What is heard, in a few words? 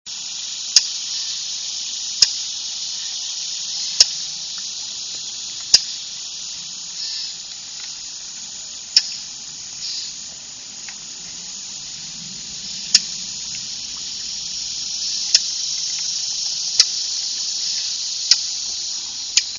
thrasher brown tssuckk, Brown Thrasher; along nature boardwalk; Great Swamp, boardwalk toward north blind; birdsongs